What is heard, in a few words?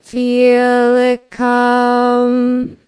singing